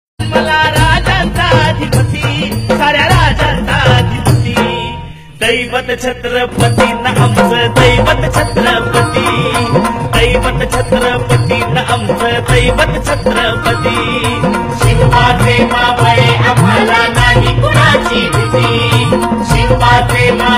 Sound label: Hindi Ringtone; Shivaji Maharaj